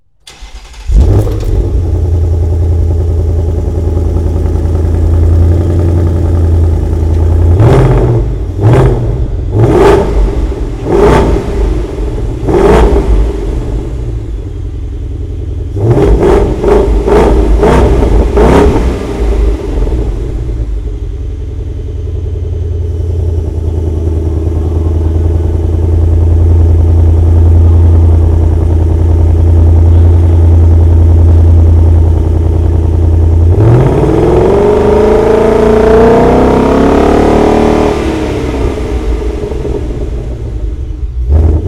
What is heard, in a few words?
mustang, ford mustang gt 5 0 v8 coupe